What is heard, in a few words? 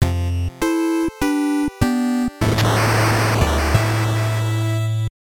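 sounds for notifications on phone in 8-bit style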